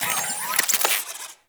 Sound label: Drone Switch Out; Warframe; Deimos; Cephalon Drone